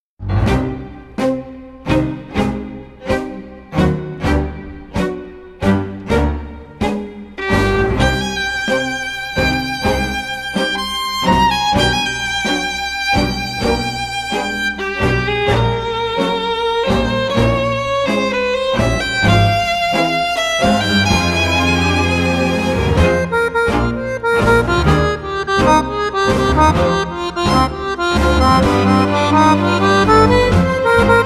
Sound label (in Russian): Tango; Sportivnye balnye tancy Standart